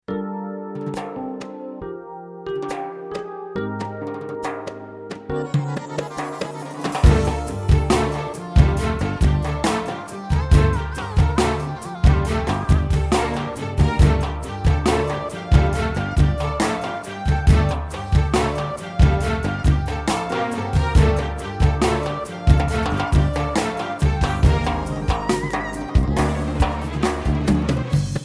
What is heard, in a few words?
Staccato